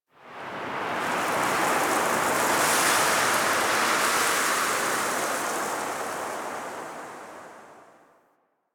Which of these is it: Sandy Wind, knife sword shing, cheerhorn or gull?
Sandy Wind